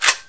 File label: hk23 draw